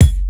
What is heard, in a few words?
KIK